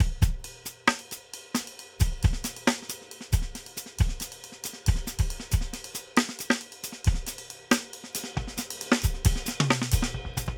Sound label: Tight and Dry 68 bpm, drums; Basement drums vol 1